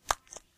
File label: button remote